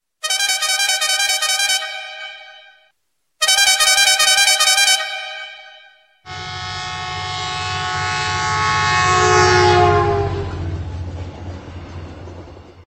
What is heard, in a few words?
Air Train Horn